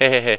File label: laugh; DinningPhilosophers